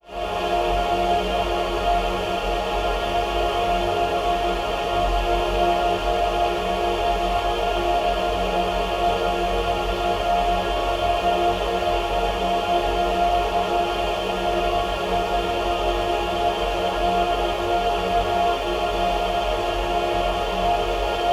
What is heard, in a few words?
pad; M8